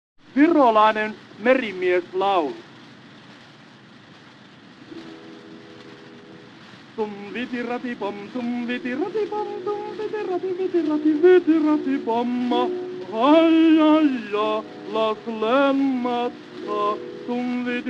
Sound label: Gramophone